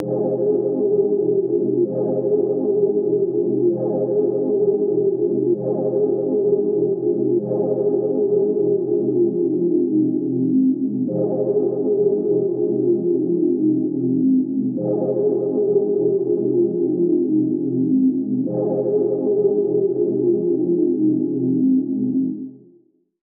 pad oasis mirage

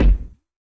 distorted band kick

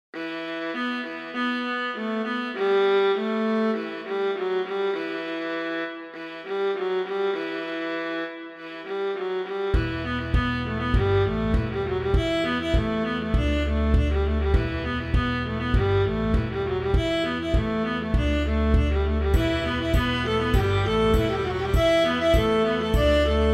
captains strings viola; ahoy

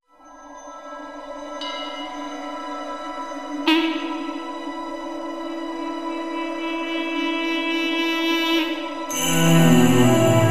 guanzi

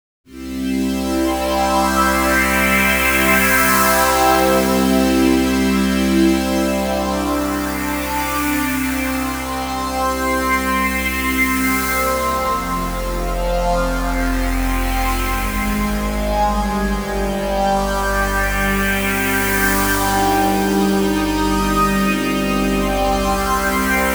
pad

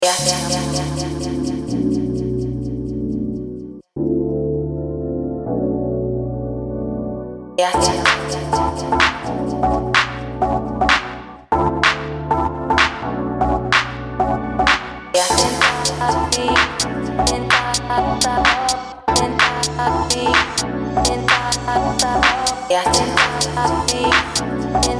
90s garage

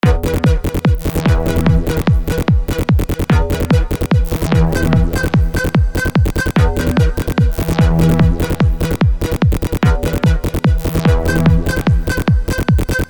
psy trance

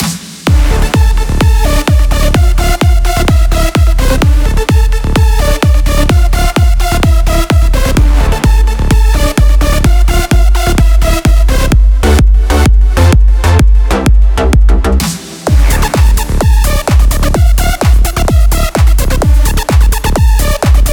kicks; ott

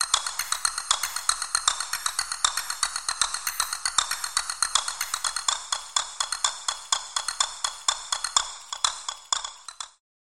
spanish castanets

